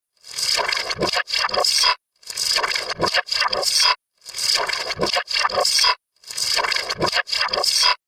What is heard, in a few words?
Weird Garbled Loop Magic; Looping Sound Effect; Fantasy, Horror And Sci-Fi Games